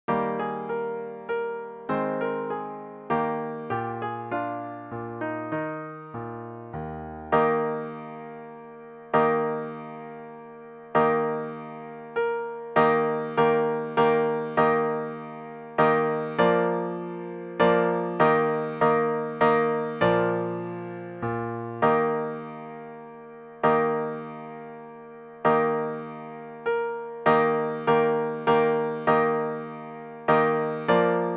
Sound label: piano only; theme from the royal fireworks; trombone baritone